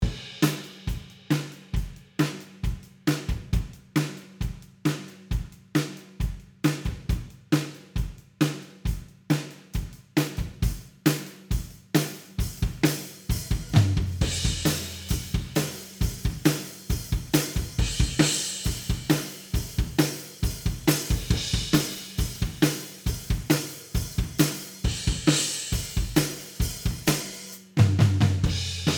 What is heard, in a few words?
Unreal Drum Mix